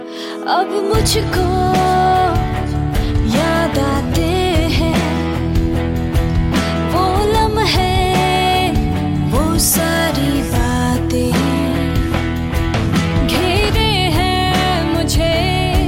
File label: New Hindi song Ringtone